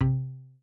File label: No Mail, Notification; Mac; microsoft office mac